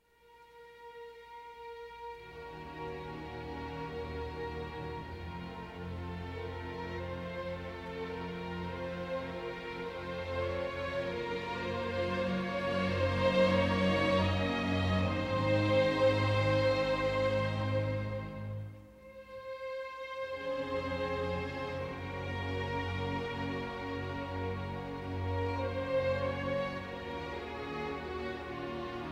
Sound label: Adagio for Strings